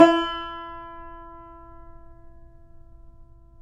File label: yayli tambur